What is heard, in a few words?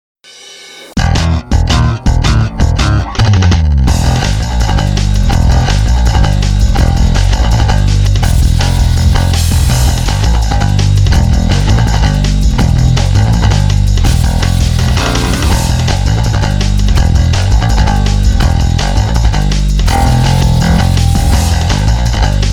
me-20b patch; ME-20B Bank 1, No. 1; Compressed sound suitable for picking.